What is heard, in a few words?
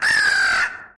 penguin scream